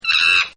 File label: CHIMP; Monkeys; Animals; Chimp 1